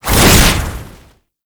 Entangle Cast Whoosh; Warframe; Oraxia

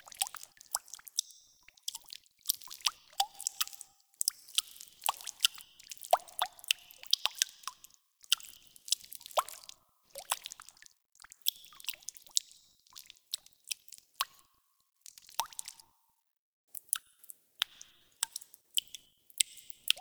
Drip Loop; Ambience; Props; Warframe; Nokko Colony

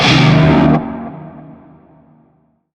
Shawzin Blues Chord; Warframe; Narmer Shawzin

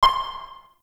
Interior Orb Station Battle Map Ping Close; Warframe; Tau; Orbital Station

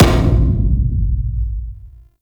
Rail Jack Weapon Three Mech Hull; Warframe; RailJack Weapons; Modular Weapons

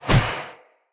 Husk Whoosh Heavy, Unarmed; Weapons; Enemy; Mockery Husk

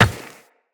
Run Rock, Footsteps; Tenno

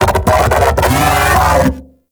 Mech Ambient Vocal

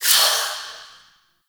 Overwhelm E; Wisp

